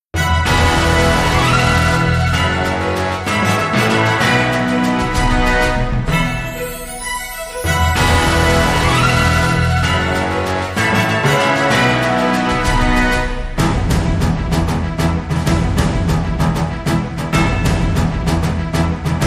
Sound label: Open The Door Underscore